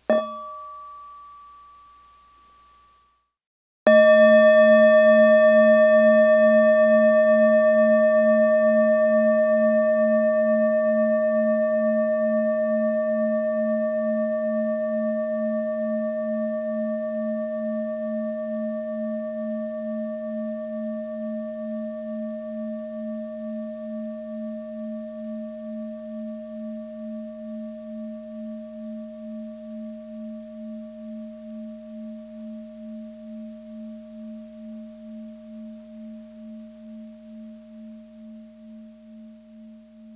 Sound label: meditation Timer Bell; dhamma